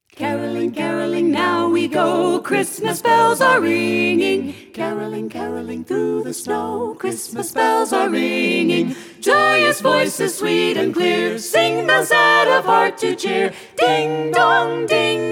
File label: Carolers Caroling; Boston